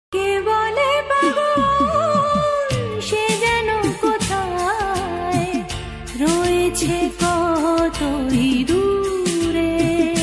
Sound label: bangla ringtone gaan romantic ringtone feeling song caller tune old song